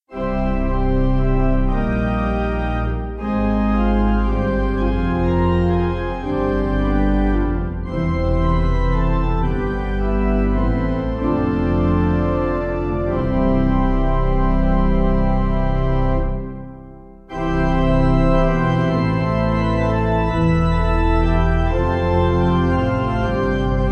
Pipe, Free Music Backing Files for Churches - Piano, Band, Pipe Organ; Causa Divina